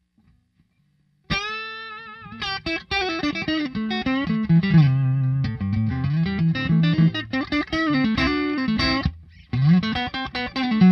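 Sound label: Neck Pup, guitar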